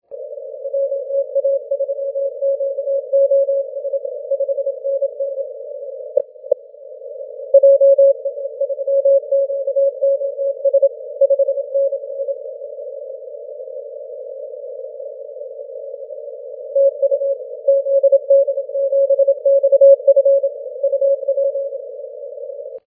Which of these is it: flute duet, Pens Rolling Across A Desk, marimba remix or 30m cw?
30m cw